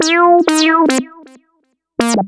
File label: lead (brass); Sonic Foundry (Sony Creative Software); Ugly Remnants VOL-2; Leads